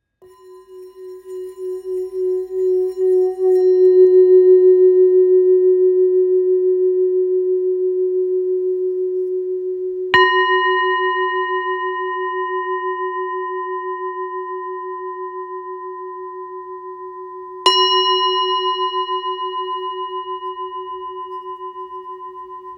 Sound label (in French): Bol ancien fa diese